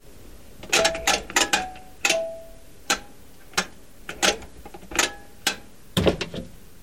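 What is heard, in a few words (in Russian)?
звук поворота ручки стиральной машины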